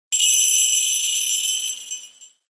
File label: christmas bells, message tones